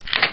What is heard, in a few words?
Switch